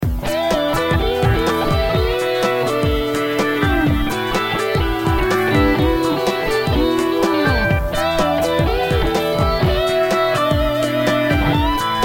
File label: Action - Electric Guitar